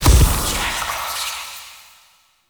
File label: Shadow Smoke Teleport; Warframe; Duviri